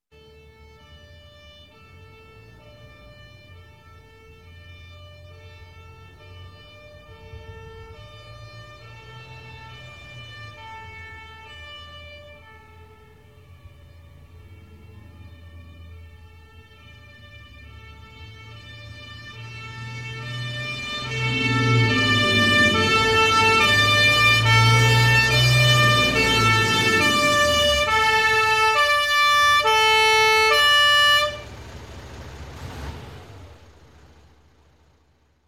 Fire Engine Siren Approach; Sound Ideas; Series 2000

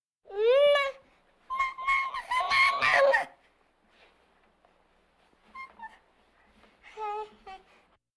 Vocal effects breathing Moaning Baby

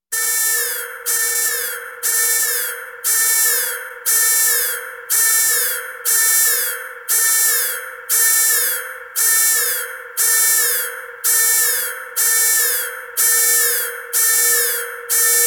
Intruder Alert, horror sounds; Hollywood Edge

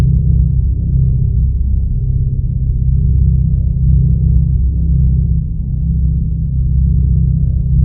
zombie drone; DeadFright

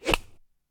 Beating Of The Waves; KMP Film Video Sound Effects

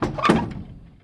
Wood Rudder Movement; SFX Kit

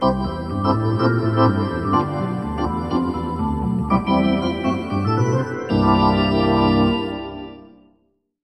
Hockey Organ

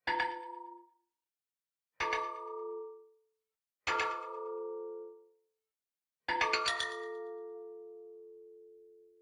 Digital Click Positive

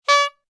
horn cartoon; NCH Library

